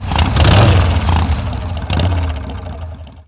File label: Hogchopper Underlay Play